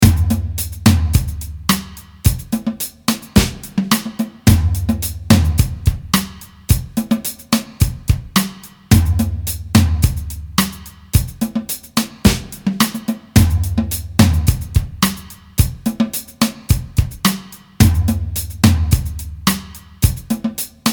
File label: 70-s drums only